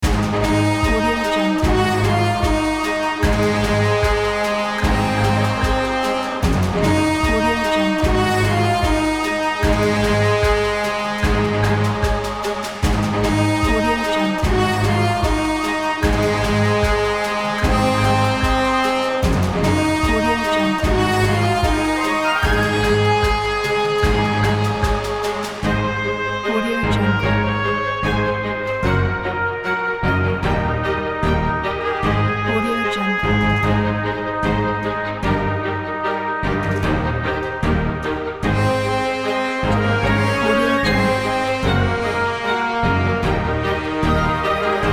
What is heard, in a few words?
heroic theme